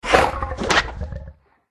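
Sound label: spider attack voice up; pauchiha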